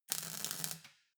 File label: weld sizzle short